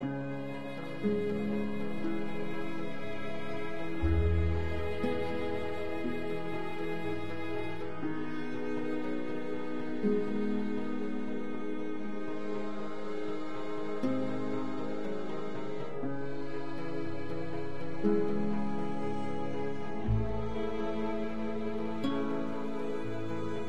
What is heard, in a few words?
Loop Neutral; the walking dead season one gamerip 2012